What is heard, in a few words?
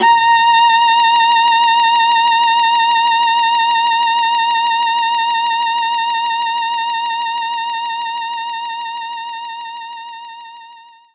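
Viola A#5; knights try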